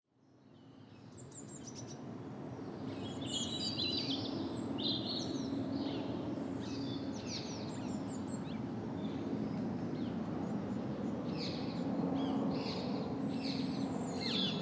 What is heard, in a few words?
A Bright Winter Morning In South East London; south east London, UK on 5th February 2025; It was a lovely, bright morning. The birds were loud, trying to compete with the sound of passing planes heading to Heathrow airport.; Cesar Gimeno Lavin; Field Recordings